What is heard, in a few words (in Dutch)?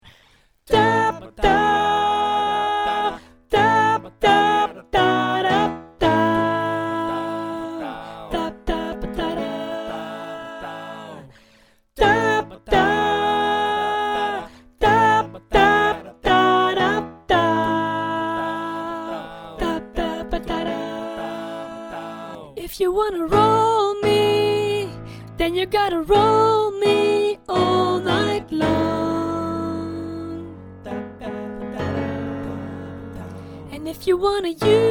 sopraan